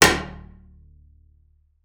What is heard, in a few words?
D1, bass pizz; Xsample VOL-5